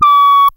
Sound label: COMPUTA TONE; Zero-G Ambient 1; COMPUTACHATA